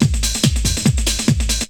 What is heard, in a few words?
Bite Size Beats